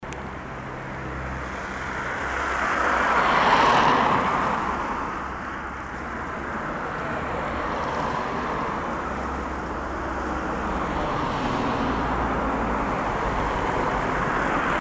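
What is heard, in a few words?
sound of road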